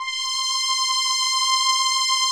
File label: SOLINA, String Machines